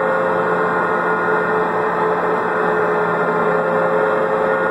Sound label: turbine rpm low, tanks; War Thunder